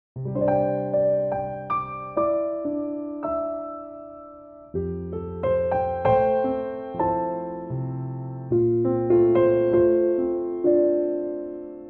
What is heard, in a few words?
C piano; key - C - vocal range - G to A; 2015 Pianotrak arrangement